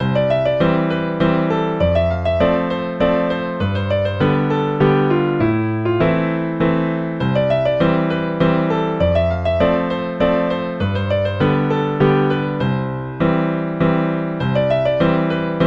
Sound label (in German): kleiner tanz in c dur